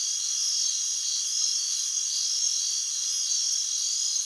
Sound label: crickets; animal